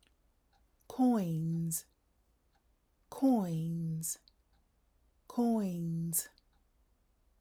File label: coins